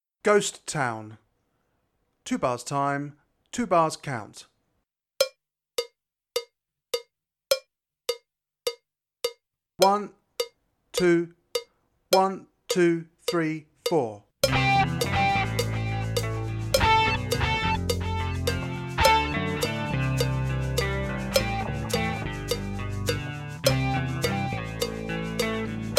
Backing, drum kit

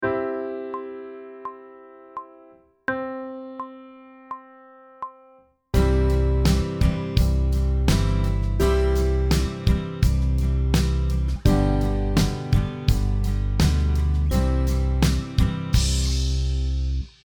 Sight Singing backing track, pop vocals; Grade 1

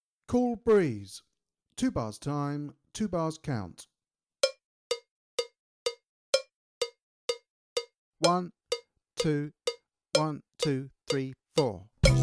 Cool Breeze, drum kit

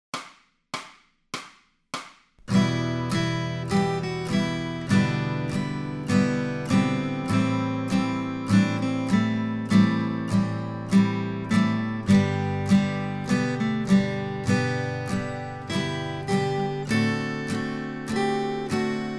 Accompaniment, acoustic guitar; Grade 2

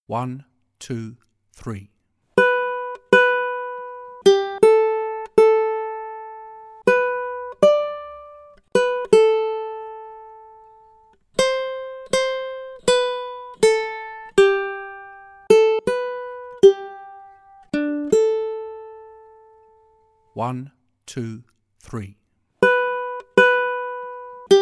Accompaniment, ukulele; grade2

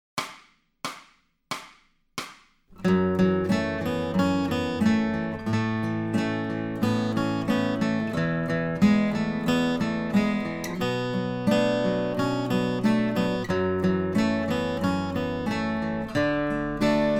Accompaniment, acoustic guitar; Grade 6

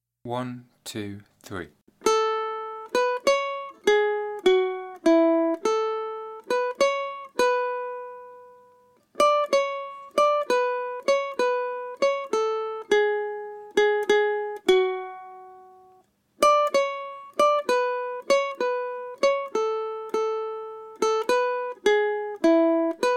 Accompaniment, ukulele; grade5

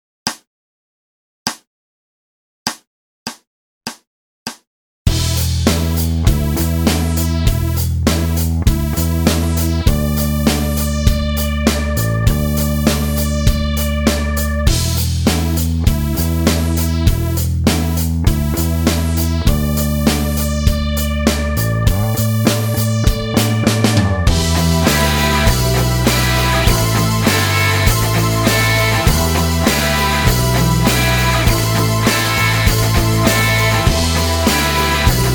Rock Backing, rock guitar; RGT; Missing In Action

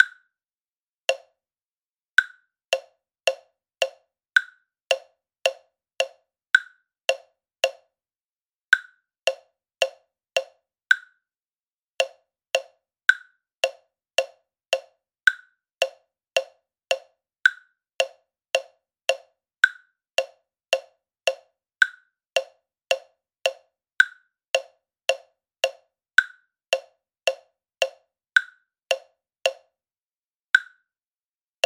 Click Study Dividing Subs, drum kit; midi

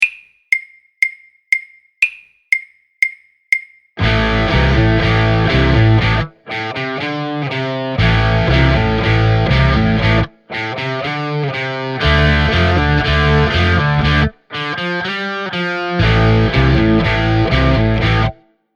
drum kit; Hard Rock Cafe; Performance Mix

